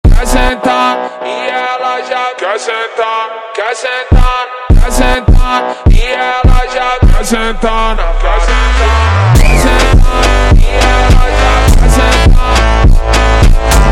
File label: Danca Solta slowed